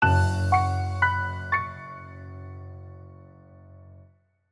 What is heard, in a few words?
Eyecatch Piano; Y Prawf Clwyd